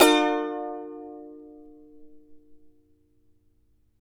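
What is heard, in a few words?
charango E minor down; Spectrasonics; Hans Zimmer Guitars VOL-2; CHARANGO CHD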